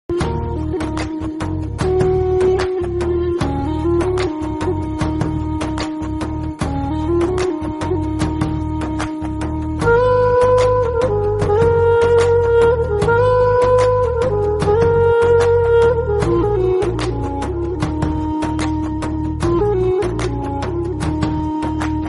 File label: love bgm; paruthiveeran